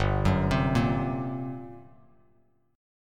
G Minor Major 11th, Bass Standard; GmM11 arpeggiated; 3,9,10,3; JGuitar

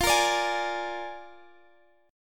A sharp 7th F

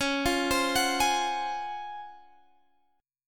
C sharp Major 11th C sharp, Guitar Standard; C#M11 arpeggiated; x,16,15,17,19,16; JGuitar

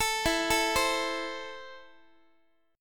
E Suspended 4th Sharp 5th E, down, Guitar; arpeggiated; Esus4#5; x,x,12,14,13,11; 4A,4E,5A,5D,5G#,6C#

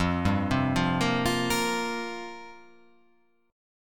F Suspended 2nd Suspended 4th F down

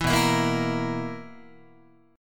B Major 7th Suspended 4th down Guitar; BM7sus4; strummed; 7,7,7,8,8,9

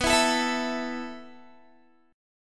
B 7th Suspended 4th B, Guitar; B7sus4; strummed; 19,19,19,21,21,x; 4E,4A,5D,5G,5C,6F

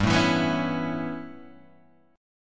A 9th G, Guitar Standard

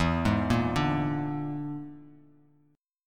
E 7th Flat 5th, E7b5 chord, Bass Standard; arpeggiated

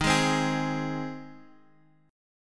G sharp Major D sharp

